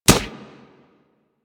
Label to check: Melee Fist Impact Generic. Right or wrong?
Right